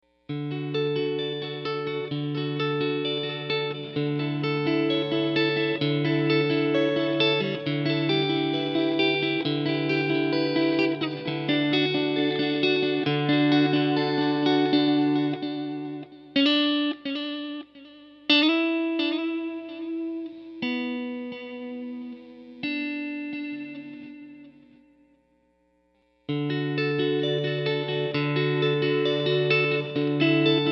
tape dly cln

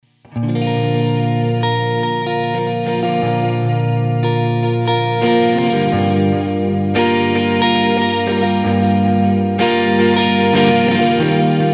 worship

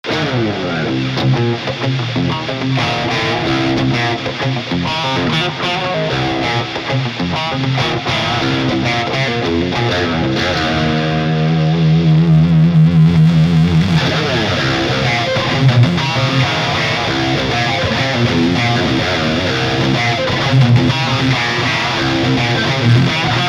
axe test bogner

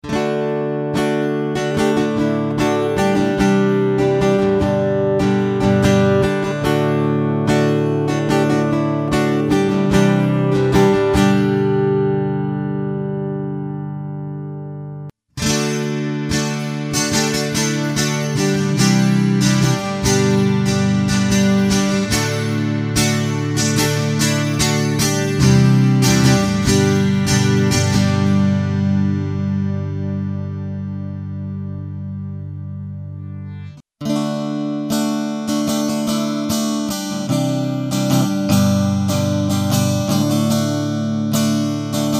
acoustic test

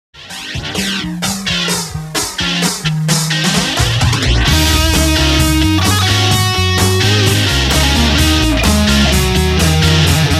solo

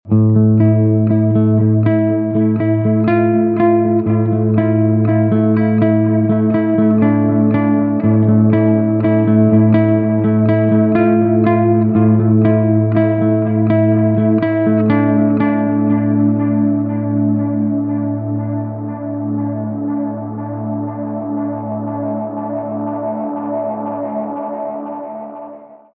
tape delay II

